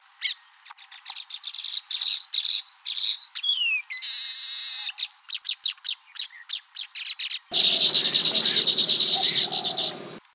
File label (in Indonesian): Suara Burung Manyar Gacor untuk Masteran